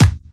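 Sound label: snare drum bass drum, Dance Mega Drums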